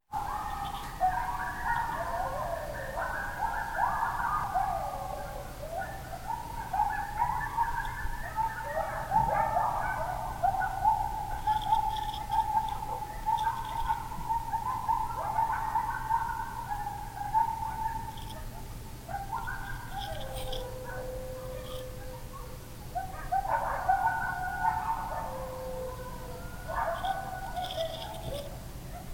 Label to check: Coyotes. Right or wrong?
Right